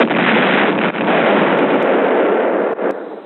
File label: boom explosion, 147bpm